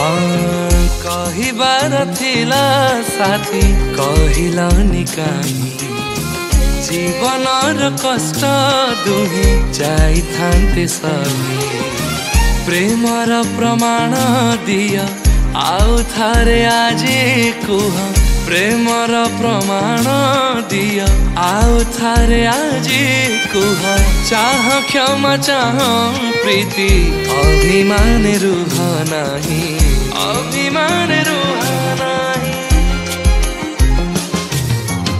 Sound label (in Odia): New Sad Song Odia